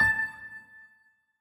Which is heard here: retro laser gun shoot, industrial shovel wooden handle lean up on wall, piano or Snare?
piano